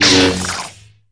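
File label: Light Saber body, Contacts avec d'autres sabres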